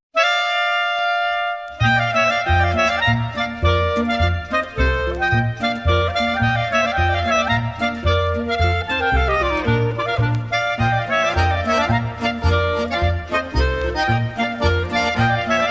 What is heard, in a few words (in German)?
Schottisch